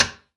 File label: metal hit